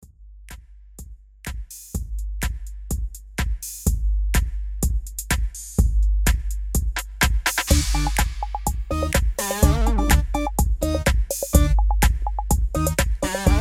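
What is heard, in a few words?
Instrumental without background vocals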